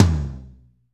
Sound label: TOM M R, Drumscapes Roland; Northstar - Drumscapes Roland